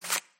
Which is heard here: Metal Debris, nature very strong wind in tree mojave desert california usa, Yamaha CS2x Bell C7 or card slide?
card slide